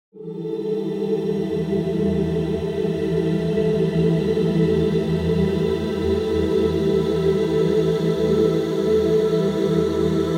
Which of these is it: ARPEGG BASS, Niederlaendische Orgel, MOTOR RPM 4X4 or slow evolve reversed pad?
slow evolve reversed pad